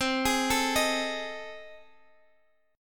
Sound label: A Minor Major 7th Flat 5th